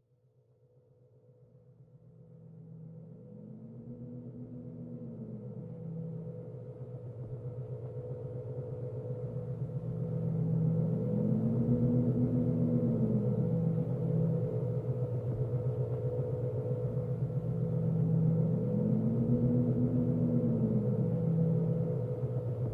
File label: ambience space; ProjectZ